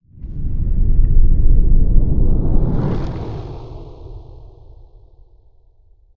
Scary Ceiling

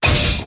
Reward Box Door Hit

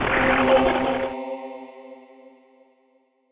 Phone Holy Transformation

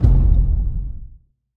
Menu Pop Up

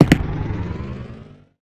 Sound Red Button Press With Activations

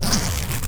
Sound Wc Zip Up

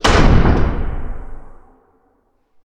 Trapdoor Open

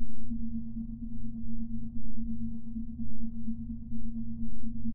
Soundtrack Loop Ambience

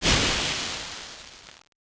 Slot Machine Steam Off

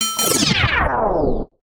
Death Countdown Reset

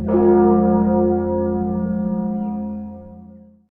Death Bell

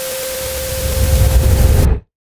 Sound ATM Reset Scary

